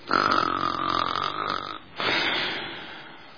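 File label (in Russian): храп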